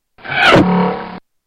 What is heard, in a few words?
arrow hit